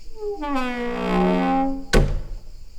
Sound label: Door